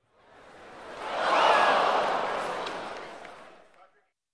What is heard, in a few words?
Crowd dismay reaction